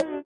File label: sitar; Spectrasonics; Metamorphosis; INDIATEK